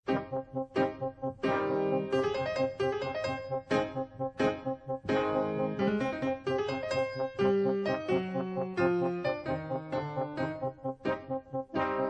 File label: Charming Piano